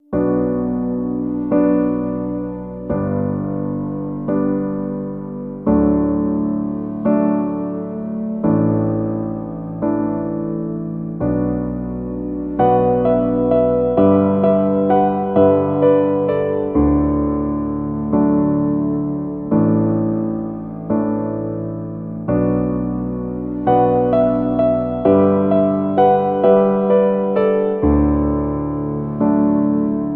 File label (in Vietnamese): Nhac Chuong Piano Tiktok